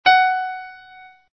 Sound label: fis2 ges2, keyboard sounds